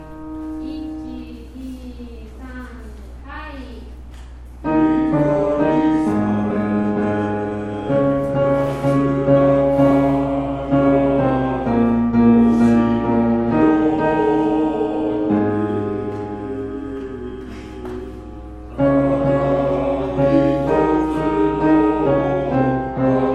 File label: akogare bass